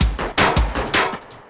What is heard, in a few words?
LOOP L, Drum & Bass 160 BPM